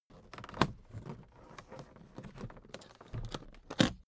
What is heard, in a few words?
suitcase handling